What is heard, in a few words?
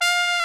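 brass bright trumpet, cheese trumpet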